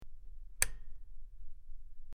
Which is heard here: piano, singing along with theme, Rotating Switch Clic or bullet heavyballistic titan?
Rotating Switch Clic